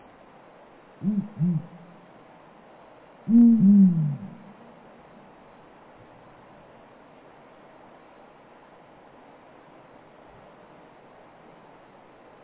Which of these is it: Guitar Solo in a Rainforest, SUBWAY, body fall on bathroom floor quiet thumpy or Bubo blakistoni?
Bubo blakistoni